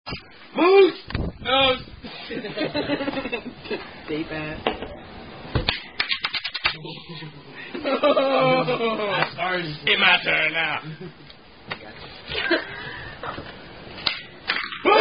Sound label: Drinking Game; Moose